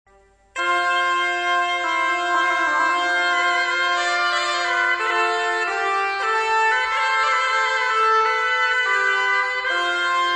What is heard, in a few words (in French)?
chalemie; instruments